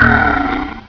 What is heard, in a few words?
pain; Cube